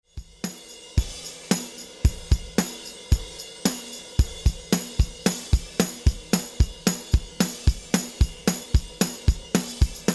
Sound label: dry drums